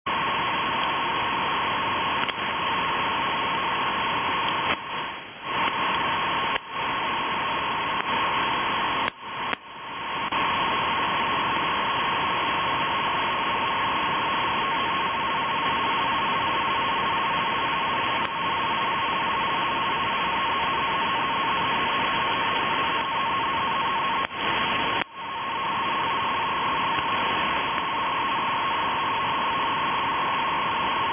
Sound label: navtex, ham